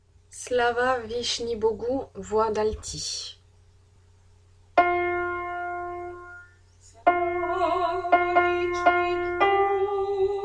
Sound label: alto; slava